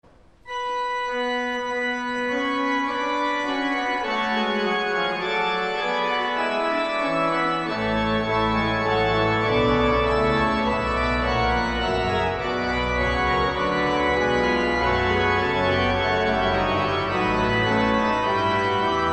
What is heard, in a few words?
Organ, Chorale Prelude; J S Bach; BWV 613